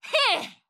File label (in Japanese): 攻撃